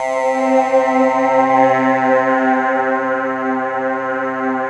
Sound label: Microwave; Optical Media International; Sonic Images Library; Waldorf salad